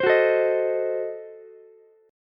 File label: D sharp Diminished 7th D sharp, Ukulele; strummed; D#dim7; JGuitar